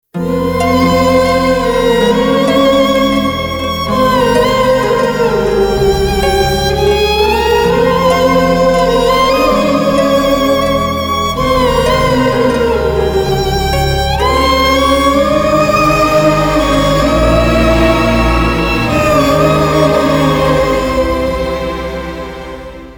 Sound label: Instrumental